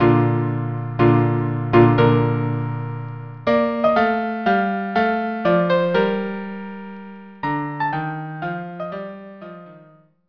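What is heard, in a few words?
March violin cello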